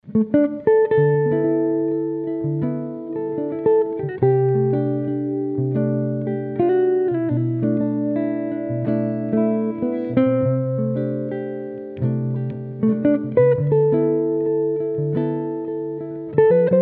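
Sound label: 16" Swiss Spruce / European Maple Archtop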